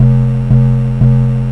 man fall; tetris; fourtris

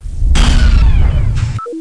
baddie power down; Gunlok

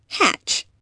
hatch

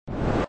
Jet Start; space escape

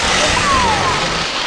skate

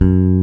fngrbass; cubic

